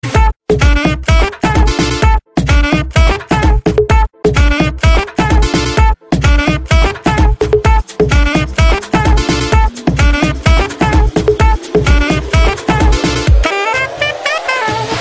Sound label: great beat, hip hop ringtone